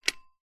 household telephone vintage rotary style pick up receiver; zapsplat